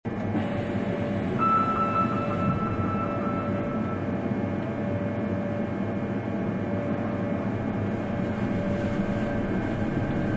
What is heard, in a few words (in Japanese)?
3000系走行音：茶山→賀茂, 日立のVVVFインバーター制御式。ほとんど音がしない; 日立のVVVFインバーター制御式。ほとんど音がしないので、音マニア的には寂しい車両。おまけに地下鉄の騒音でほとんどかき消さ; 走行音・ドアチャイム